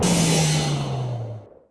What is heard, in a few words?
Shield Shutdown; SWARM